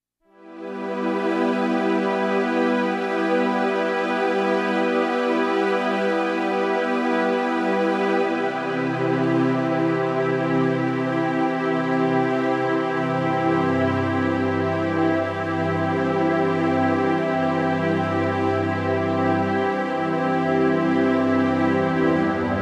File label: Major Synth String Chords; Beds